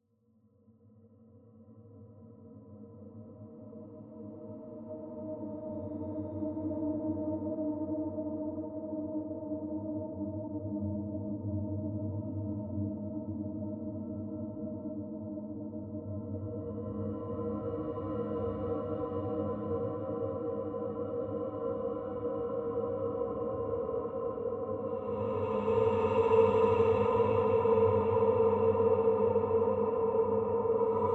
Drone Mid - Eerie Fairy; Boom Library Cinematic Horror Bundle; Construction Kit

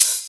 Hihat, Psytrance; One Shots

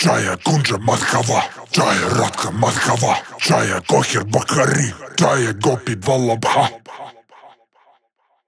138 Shamanic Voice (Wet), Psytrance

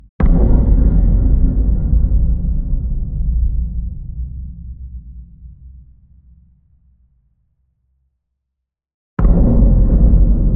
Fat Drum Booms

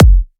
Kick, Psytrance; One Shots

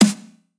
snare; Singomakers; Psytrance Mantra; Drum Shots

